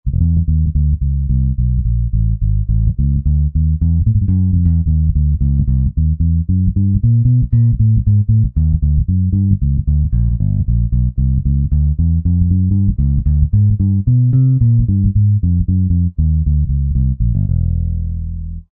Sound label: Jazz, Roland SR-JV80-10 Bass & Drums; Marcus Jazz